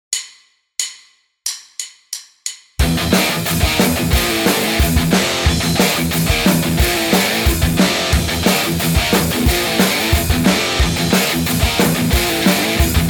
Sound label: Riff, guitar